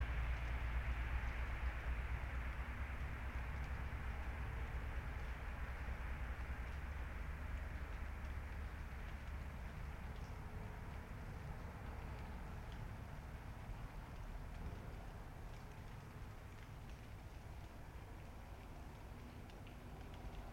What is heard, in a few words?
yamanakako sound